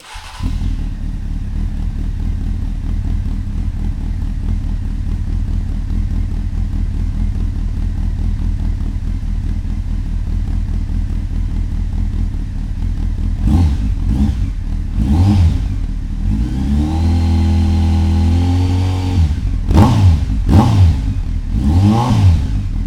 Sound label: without tips, CB900F